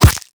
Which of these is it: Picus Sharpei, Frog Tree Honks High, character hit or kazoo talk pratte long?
character hit